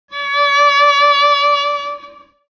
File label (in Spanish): violin largo; primartis; sonidos